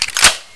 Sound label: autocannon reload; gusanos promode